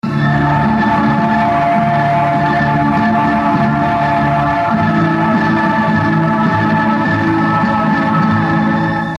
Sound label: ohhh